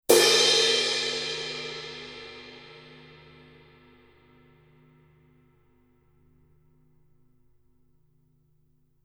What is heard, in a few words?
color sound 900 19 purple crash edge, paiste